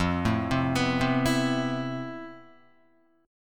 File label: F Major Sharp 11th, Guitar; arpeggiated